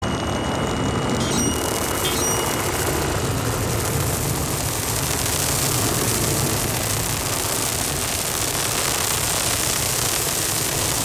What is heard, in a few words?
Noise Electronic Music